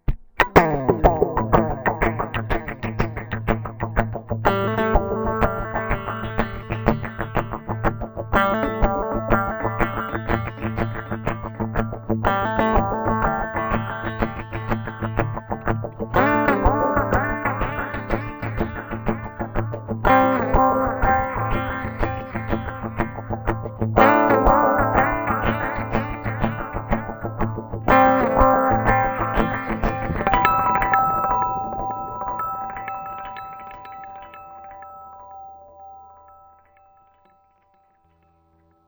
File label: Band Delay